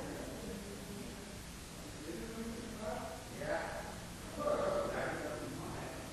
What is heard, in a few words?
moaning